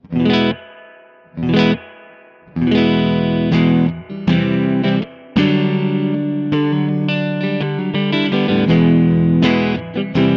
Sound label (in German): Crazy Tube Circuits White Whale Effektgeraet Rev Long Alle Regler 12 Uhr